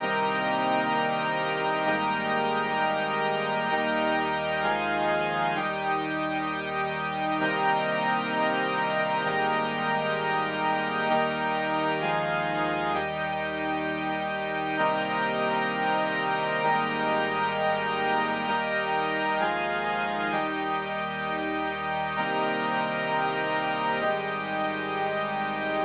Pad Wide Pitchshift Delay

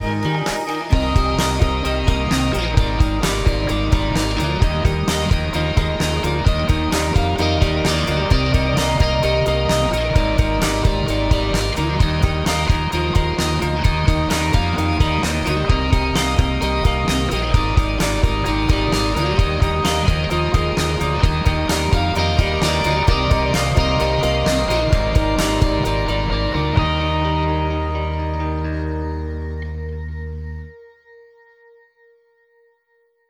Mix No Gtr Pad Pno Cym

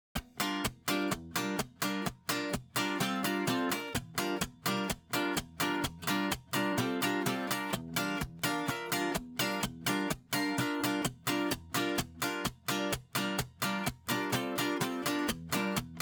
Ac Gtr Untuned

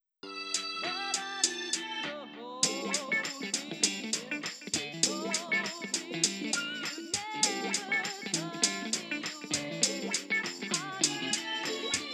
Rev Alts Delay Solo

